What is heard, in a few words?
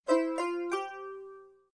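short sms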